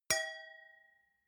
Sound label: wine glass different clink bounce dull soft; berufe; gastronomie; soundpool